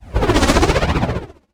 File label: Whoosh; Hamsterdam; TIA Whoosh